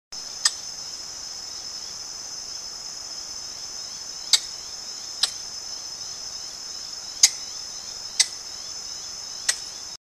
Funisisciurus leucogenys, Red Cheeked Rope Squirrel; Sangha Lodge